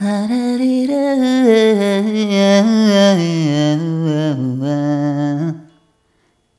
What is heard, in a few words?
Rhythmic A